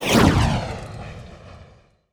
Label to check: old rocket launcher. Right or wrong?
Right